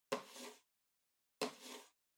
PC-Mouse-Move (Far-Wood-Hit)

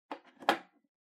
Pendulum Clock Hardware Key Holder Put In

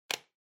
Calculator Switch

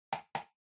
PC Mouse Far L Hard Double

